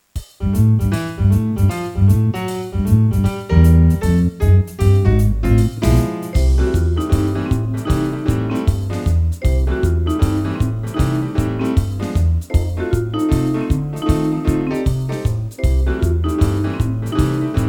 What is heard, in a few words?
Swing Boogie